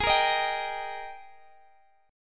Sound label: Ab Major 7th Suspended 2nd, chord